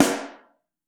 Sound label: SN3 Mono, Snare